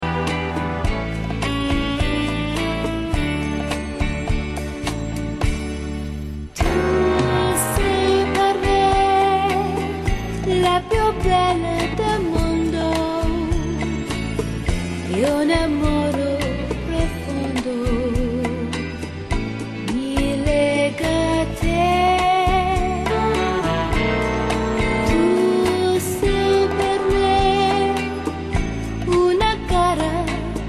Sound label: rumba